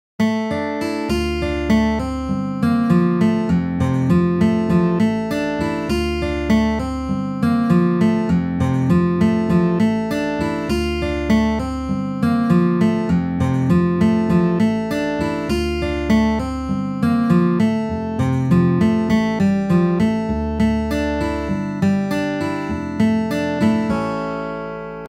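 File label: guitar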